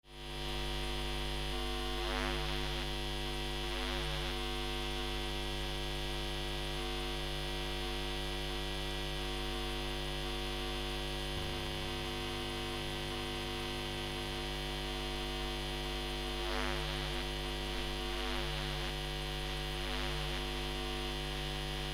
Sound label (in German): Gitarrenbrummen